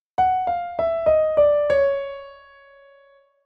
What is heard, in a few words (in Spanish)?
melodía piano